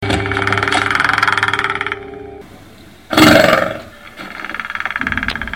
Predator Sound

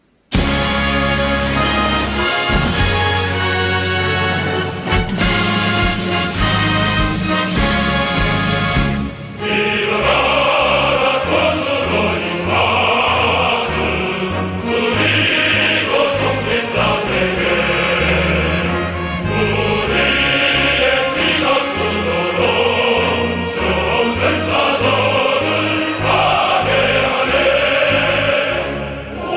North korea song

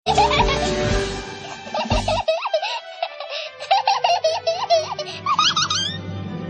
Childrens Laugh

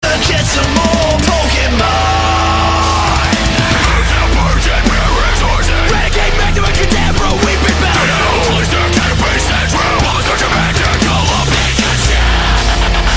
Rap metal; Pokemon

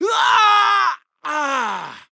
Castle Screm

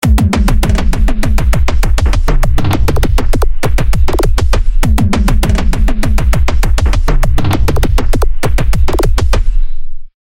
Drums

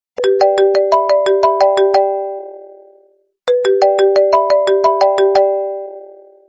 Marimba

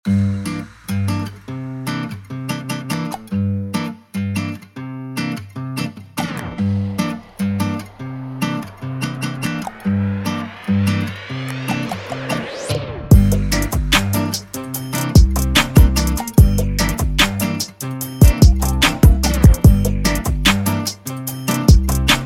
Islands Instrumental

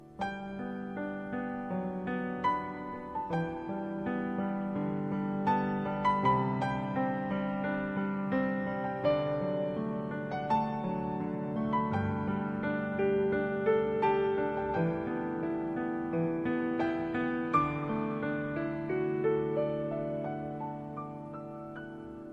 Piano Melody - Sad

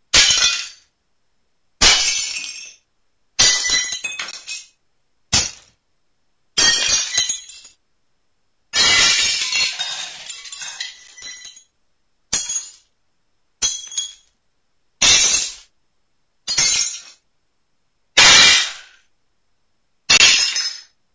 Glass Break

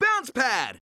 Sonic Boom Bounce Pad

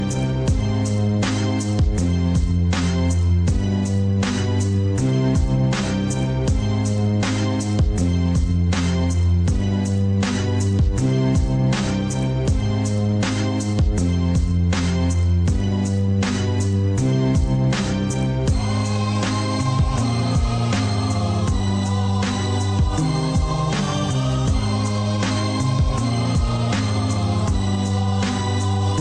Gangsta Instrumental

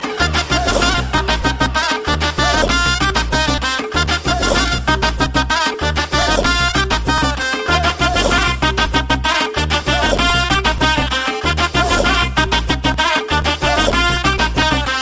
Pungi

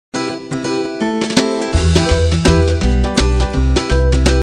Vodafone Sms; Newest Vodafone

